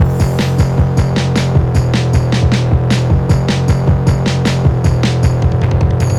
Chase or Exciting Battle (modern, 155bpm); Ultimate Everything Music Bundle; Loops for All Occasions

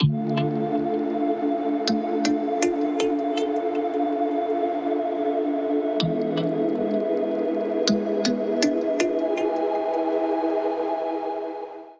jingle sad long; Survival Metroidvania Vol1

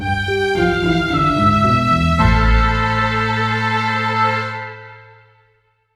Bad News Orchestral 55bpm; Ultimate Everything Music Bundle; Stingers, Blips and Dings

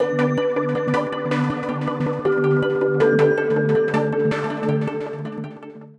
stinger lose, Cinematic Battle Music

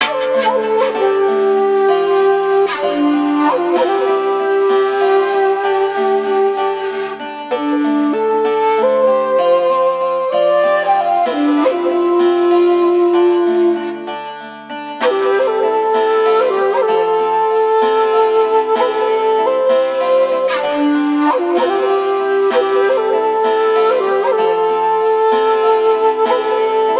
Martial art; Chronicles of the illusion world part 2; Dark Fantasy Studio

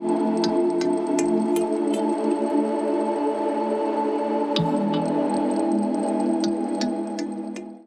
jingle neutral long; Survival Metroidvania Vol1

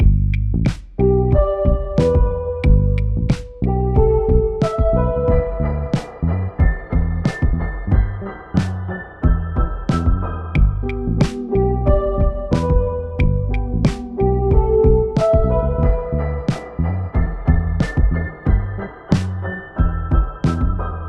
Friendly Village (Modern, 91bpm); Ultimate Everything Music Bundle; Loops for All Occasions

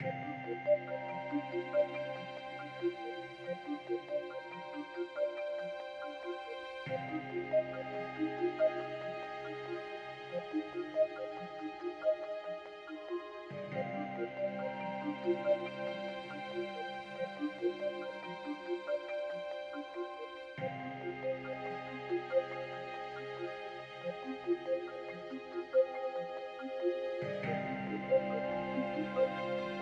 EXPLORATION LOOP Lost in the Wild Ambience 140bpm; SAGA Complete Collection v2.0; SAGA II - Emotional Exploration

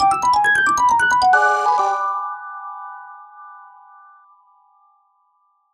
Failure Trouble Afoot With Stinger modern 136bpm; Ultimate Everything Music Bundle; Stingers, Blips and Dings

